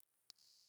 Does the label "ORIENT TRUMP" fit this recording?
No